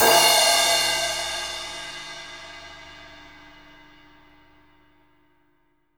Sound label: Cymbals Crash; Vengeance essential club sounds-3